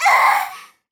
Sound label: Hit Voice